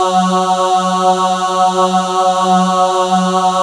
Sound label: CLEAR VOX F#2, clear voices